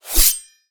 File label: exsheath